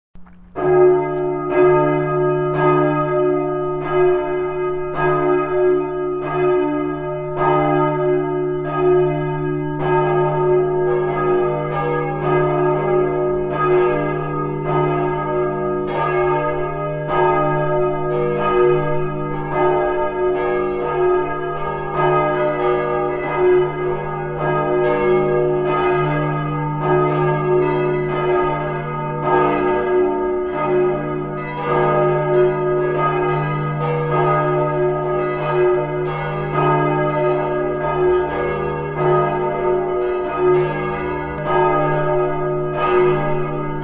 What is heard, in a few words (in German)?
Glocken; Trauergeläute; Molldreiklang (mit Gl. 1 beginnend); St. Lorenz